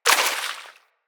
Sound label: Bullet Impact Debris Water; Weapons